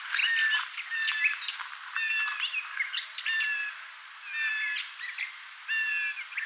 call, Blackcap, The alarm (plaintive call) was triggered by a flying hawk, probably a Sparrowhawk. Background: Blue tit and Blackcap song.; sylvia atricapilla